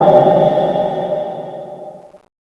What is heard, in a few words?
ominous